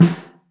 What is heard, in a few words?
Mono, Snare; Ableton Foundation FreePacks; Snare Designer